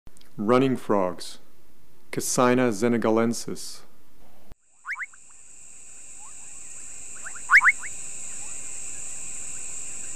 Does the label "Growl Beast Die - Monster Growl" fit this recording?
No